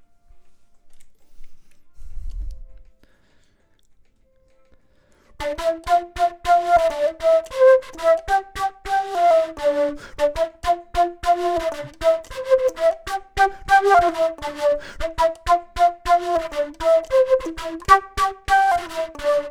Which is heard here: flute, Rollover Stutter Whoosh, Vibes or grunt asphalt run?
flute